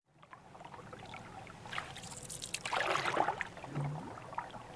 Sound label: Canoe Paddle Light Paddling In Canoe